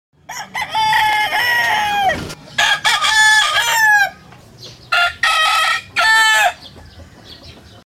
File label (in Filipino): Tiktilaok, notifications; MyPhone; my96-DTV